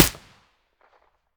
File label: aps silencer mono; STALKER; IWP